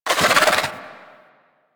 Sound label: Fairy Dust Cast; DE; Warframe